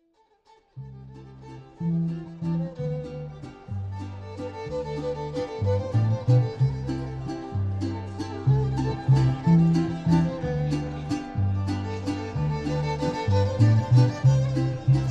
Mariachi; Minneapolis

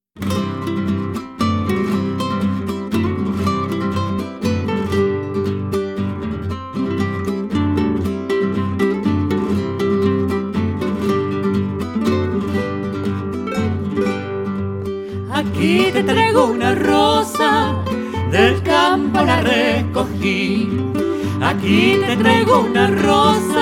Latin Ensemble

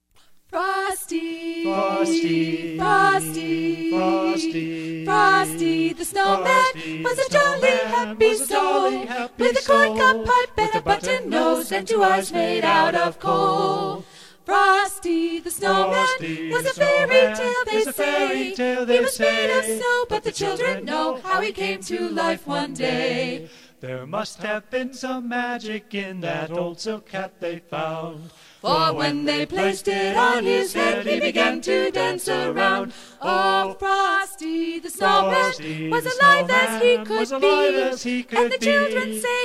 Christmas Carolers; Denver

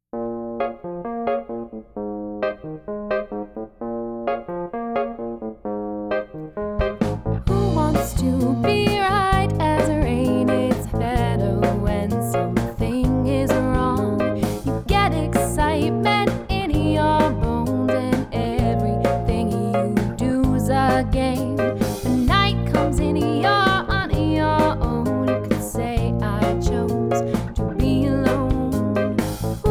Variety Band; Boston

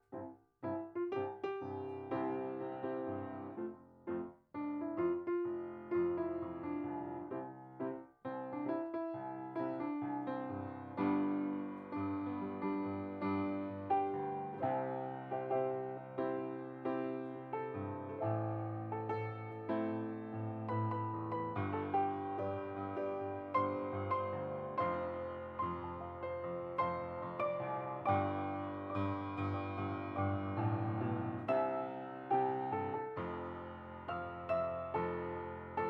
Pianist; Orlando Pianist; Keyboard Player

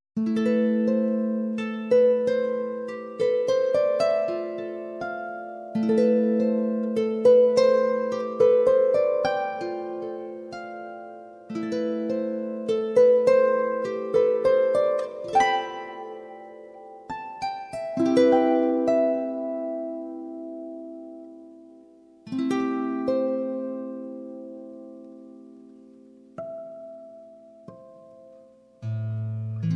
Harpist; Toronto

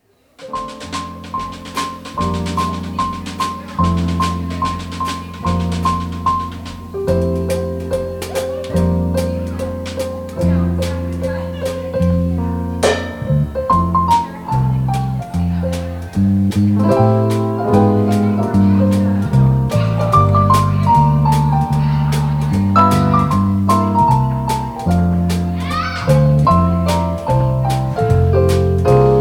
Jazz Band; Phoenix

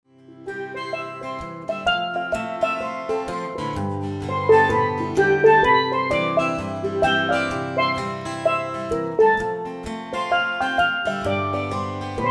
Steel Drummer; Tampa Steel Drummer; caribbean

